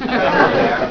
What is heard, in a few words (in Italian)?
suonerie persone risate